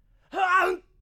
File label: male death